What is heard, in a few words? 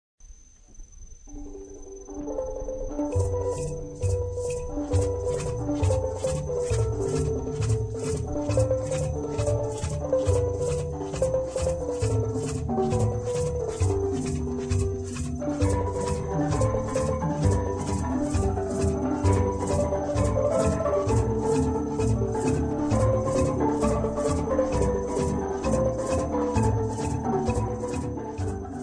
steel band clash